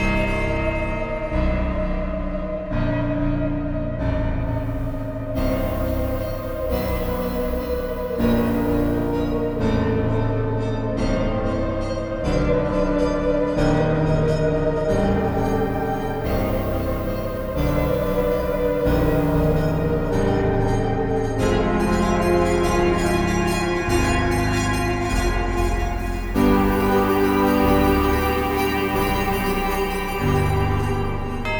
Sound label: Ghostly Enchantment, fantasy